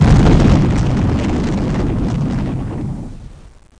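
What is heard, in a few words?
LARGE EXPLOSION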